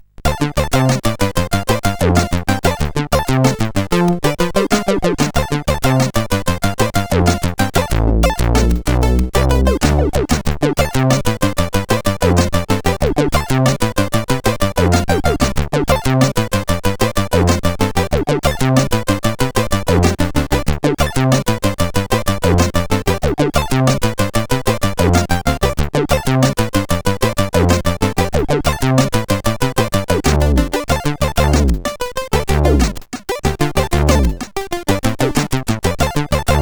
Funkee, CSG8580R5

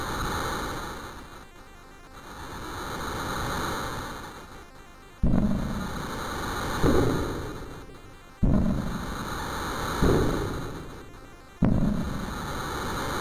Little Intro Music, MOS6581R2

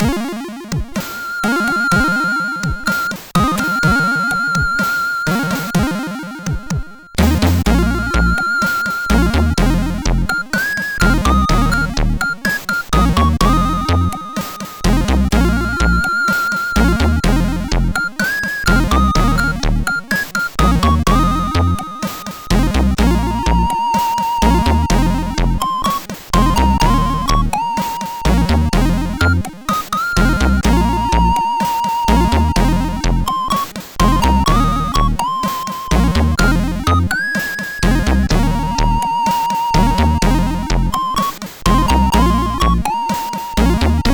Romantic

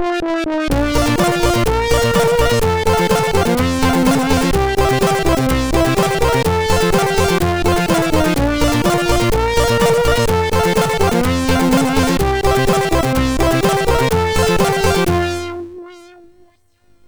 Sputnik MOS6581R4

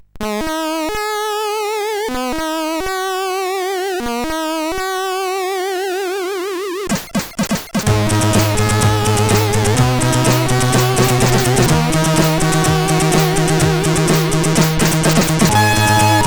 Alien Sound, sid, 8580; Ozone

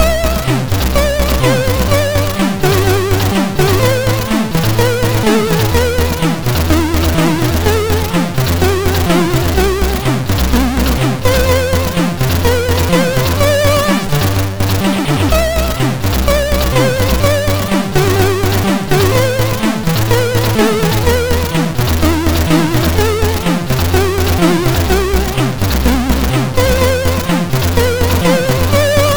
Russian Anthem, sid MOS6581R4

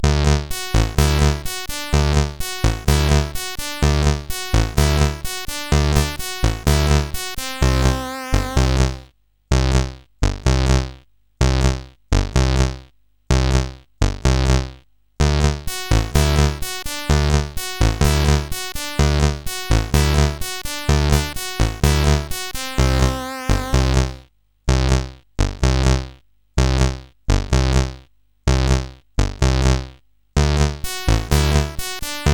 Melody, sid CSG8580R5

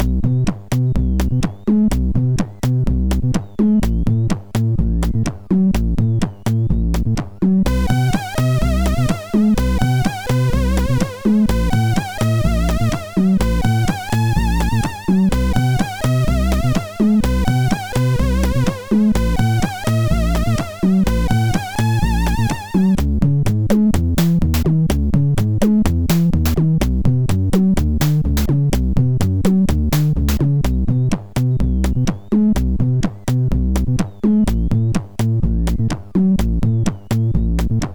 Music, MOS6581R4

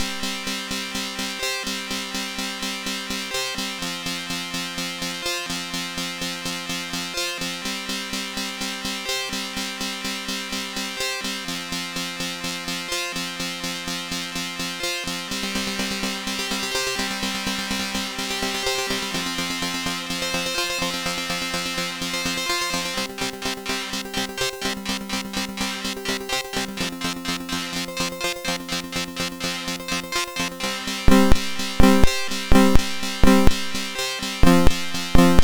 Guitar, sid MOS6581R3

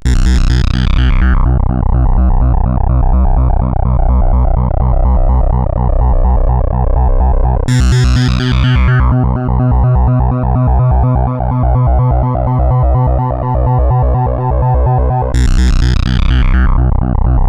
Bass Mania, sid CSG8580R5

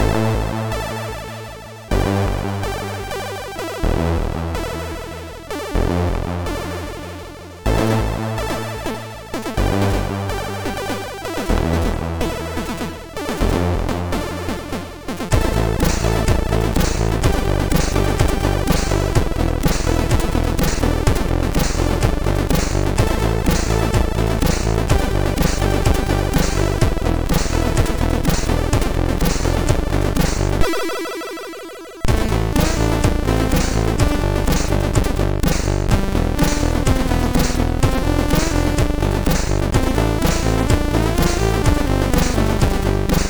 Acid Disco, MOS6581R3

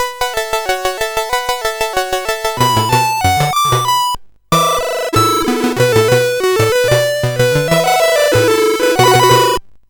sid CSG8580R5, GAMES; Continental Circus

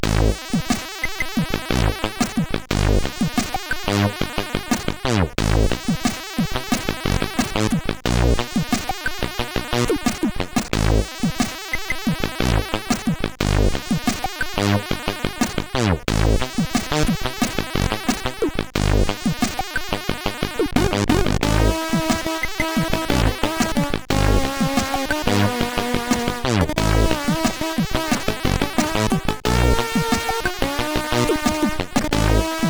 Pod Jams, sid CSG8580R5

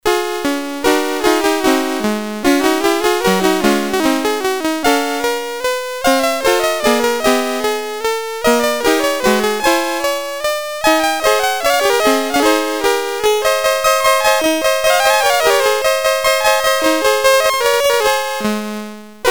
Menuetto D Major, CSG8580; CSG8580R5

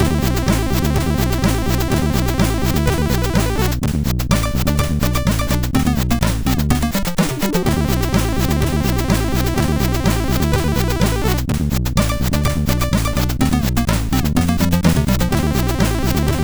Disko, sid, MOS6581R4

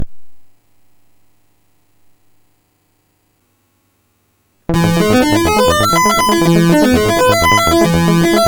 Lead De-tuned, MOS6581R4

